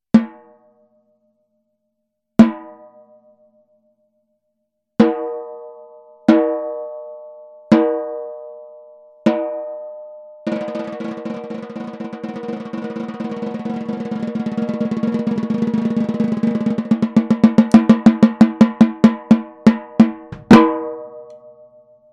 PEARL MAHOGHANY 14X65, CAISSES CLAIRES

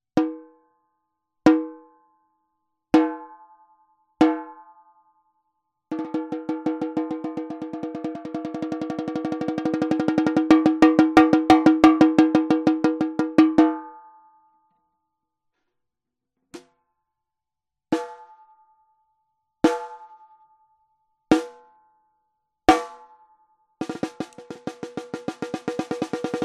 DIXON ERABLE 10X5, CAISSES CLAIRES

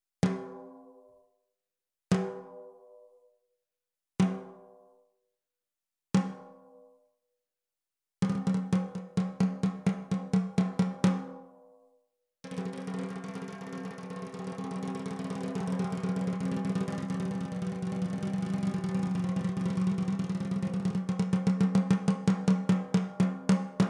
LUDWIG PIONEER 14X5 MIX, CAISSES CLAIRES

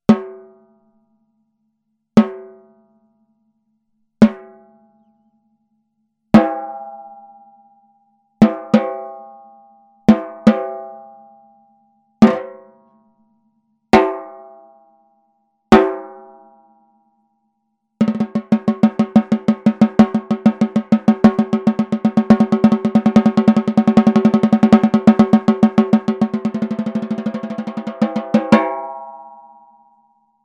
ACROLITE ALU 14X5, CAISSES CLAIRES

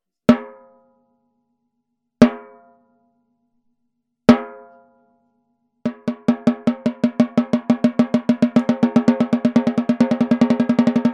BRASS 13X35, CAISSES CLAIRES

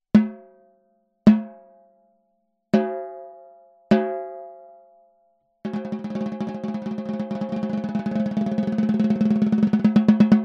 TAMA STRACLAS MAPLE 14X6, CAISSES CLAIRES

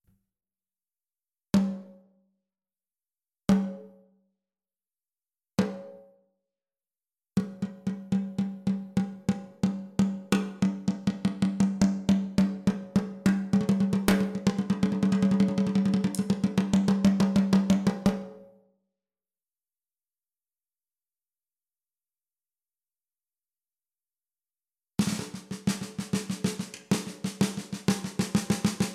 SONOR PHONIC ROSEWOOD 14X65 MIX, CAISSES CLAIRES

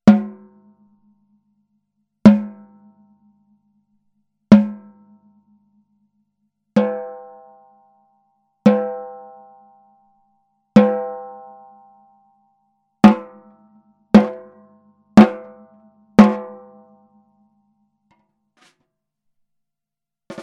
PORK PIE BRASS 14X65, CAISSES CLAIRES

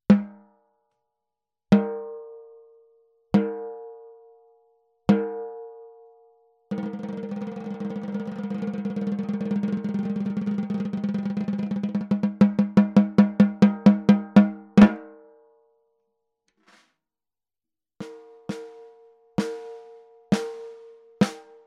WALNUT 14X65, CAISSES CLAIRES

